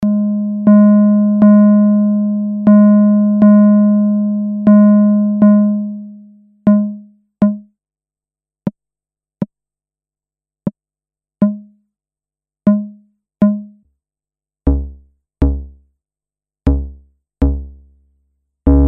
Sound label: Decay all VCO Modes